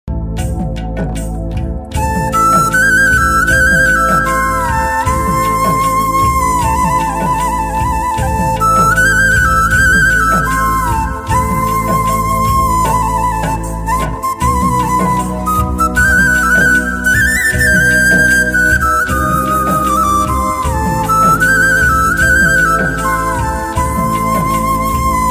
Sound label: instrumental ringtone bansuri ringtone flute ringtone